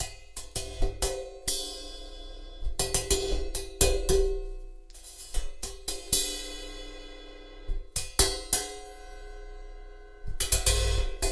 Cymbal; Percussion Samples

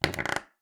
Palette, WoodenPalette

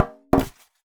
Polystyrene Rattle

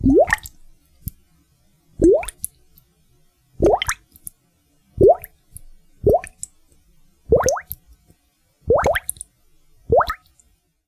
Bubble Chain; Bubbles

